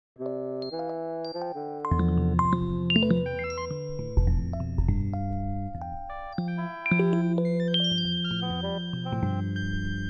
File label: oboe and Rhodes piano permutations in 17 tone major